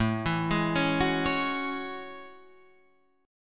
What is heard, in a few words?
A Major 13th, down Guitar Open F; AM13 arpeggiated